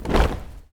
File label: Stompin' Feet, Africa; Roland LCDP14 Africa VOL-2; Foot & Mouth